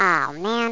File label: die